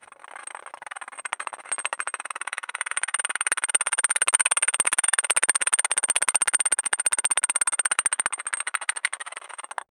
quick enchanted gem movem; gems

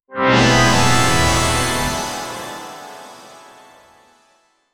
short aerial logo animati

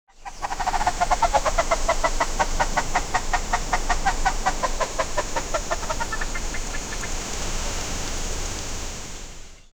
ambience

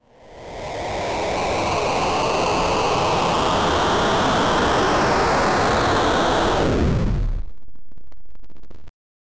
whoosh, sound-effects

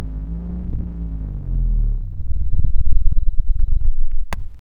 electric

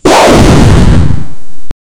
aircraft

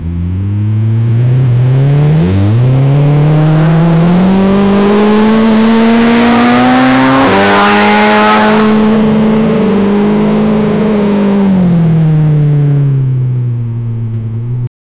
gti roaring while station, motorsports

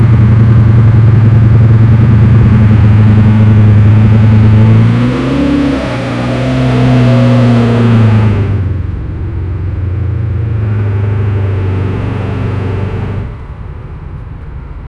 car engine muscle car; muscle cars

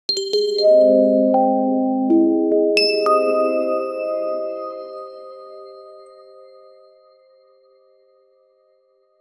vibrant ringtone sparkl

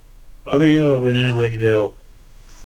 a man with a playful

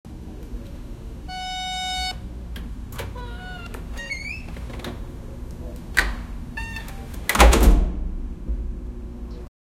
store door opens; retail

